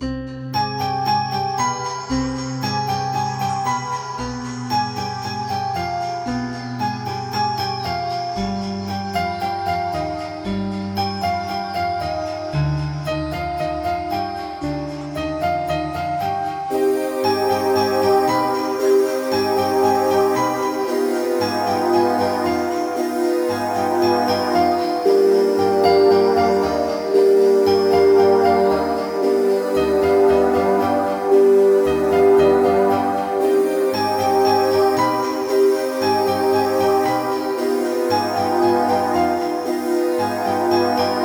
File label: Loop 115bpm; Svaior; jenny leclue detectiv